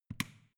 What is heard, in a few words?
switch small turn off hard